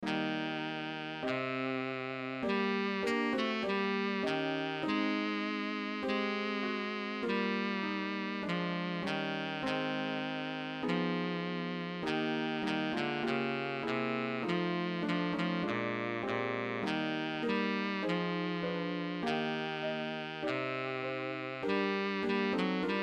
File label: Bass